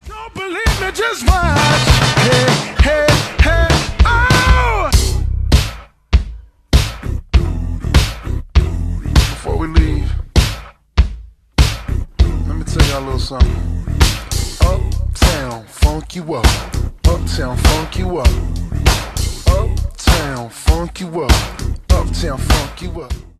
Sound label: slowed remix